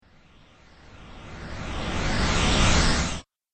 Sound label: Sci Motion Flyby, whoosh sound effect; Andrew Fleming